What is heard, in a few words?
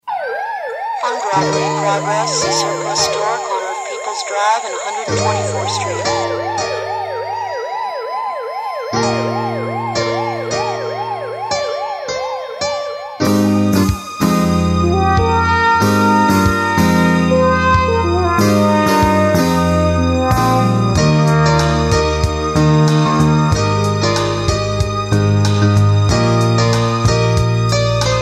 ringtone; hill street blues